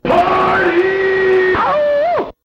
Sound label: AWWOOH